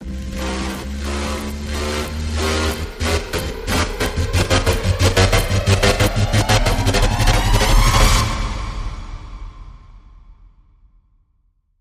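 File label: Rise Rhythmic Gyration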